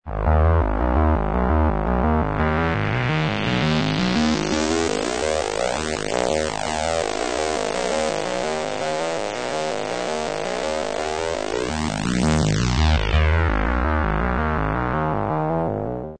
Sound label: bass lead